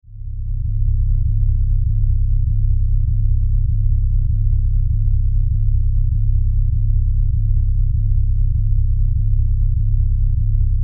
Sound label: Science Fiction Power Drone Rhythmic Pulses; Drones; Sound Design; SFX Bible 6